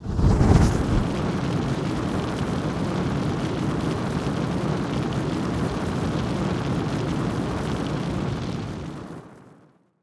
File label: Stop Watch Fire